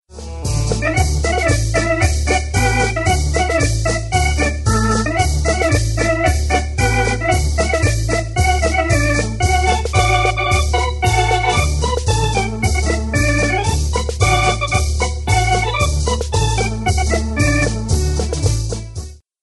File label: remix